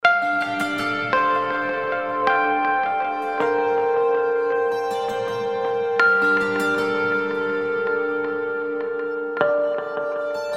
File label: High Notes Electric Guitar